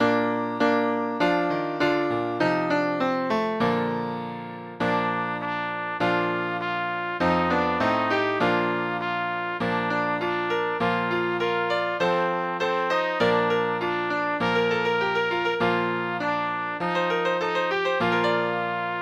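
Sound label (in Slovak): pre trúbku a klavír; Jak si krásne, Jezuliatko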